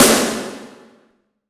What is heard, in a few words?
80s Drum Snare; Yamaha PSR E305